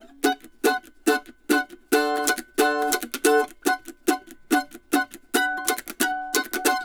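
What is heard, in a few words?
Country Mandolin Chorus; Digidesign; Pro Tools Essential Loops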